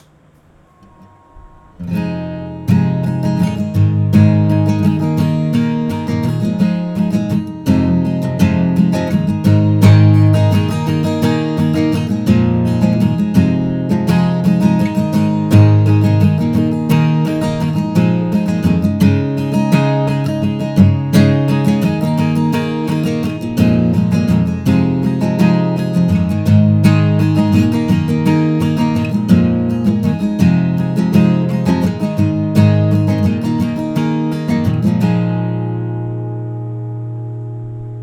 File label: guitar